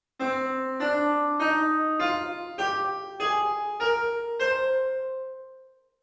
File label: c natural minor scale